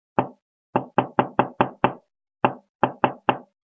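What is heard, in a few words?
knocking sound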